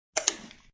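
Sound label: Mixer Lid Close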